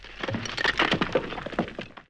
rubble; lander

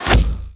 ARROW; DARKOMEN

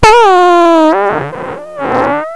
squeak; whomp

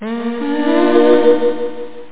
AHH AHH

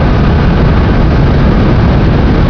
atmosphere; KATANA; Daikatana

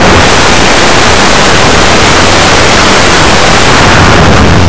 gear

